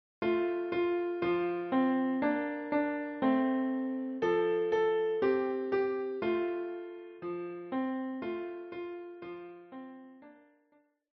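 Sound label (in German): Old Mac Donald Klavier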